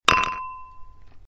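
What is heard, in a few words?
wind chime; huurin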